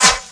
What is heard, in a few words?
swipe; Fruit Slicer